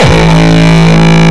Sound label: Longkick, kicks